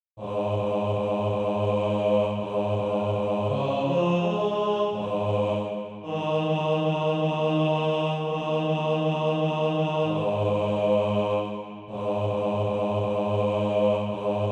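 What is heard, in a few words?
bass, Practice Music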